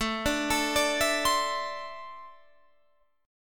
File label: D 7th Suspended 2nd, chord